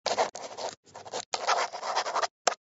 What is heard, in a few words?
Nokia Message 7, ringtone